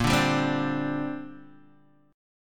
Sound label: Bb 6th Add 9th Bb Guitar DADGAD